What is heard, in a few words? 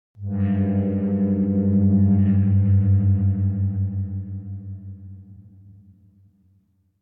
Bass Horn Reminder 2 - Higher, Semitone Disharmonious; Hollywood Edge; sound designer's toolkit v2